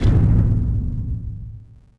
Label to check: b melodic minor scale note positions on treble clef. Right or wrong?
Wrong